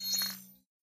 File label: Propeller hat Collect; Propellerhat Space Collect